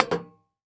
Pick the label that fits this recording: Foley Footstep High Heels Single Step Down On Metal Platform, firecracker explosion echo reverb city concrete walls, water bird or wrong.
Foley Footstep High Heels Single Step Down On Metal Platform